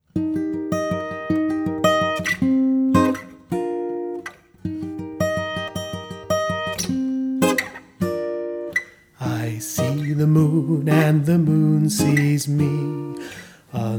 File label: I See The Moon male